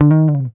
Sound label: D#2 GTR TRIK; Univers Sons; Jazzistic